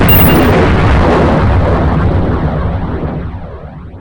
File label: Explosion